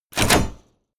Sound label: sci-fi mine place; schusswaffen